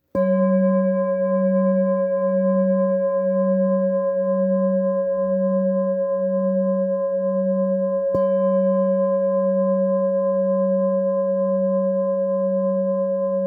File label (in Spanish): Fa 183 jhumka, cuencos tibetanos